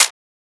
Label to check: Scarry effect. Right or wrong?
Wrong